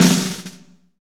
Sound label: snare, lite jazz, Roland; Northstar; Drumscapes Roland; AC Lite Jazz; Snares